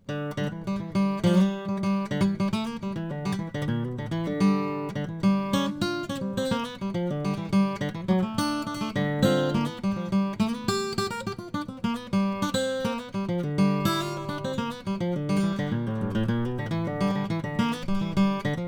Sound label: Auditori OM Mahogany; Chris Bozung